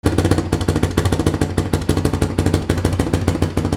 VEHICLES

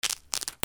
RIPPING

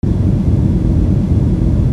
AMBIENCE

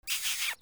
CARTOON

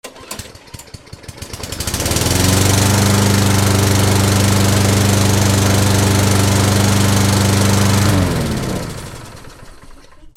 GARAGE

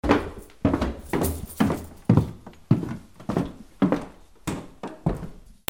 HOUSE

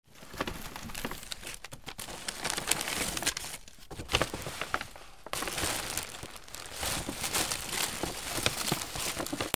PAPER

